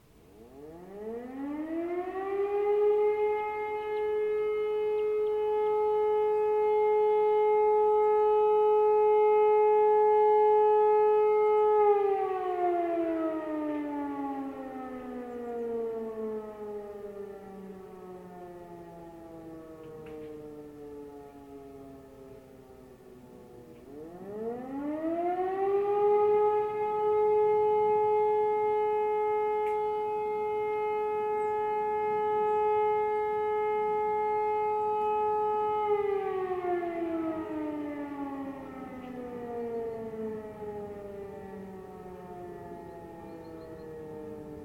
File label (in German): sirene